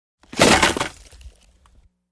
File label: Sngl Ice Break